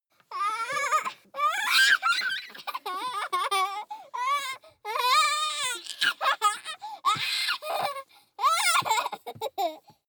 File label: child laugh tickle scream sequence